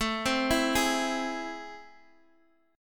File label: A Minor Major 7th A, Guitar, Half Step Down; AmM7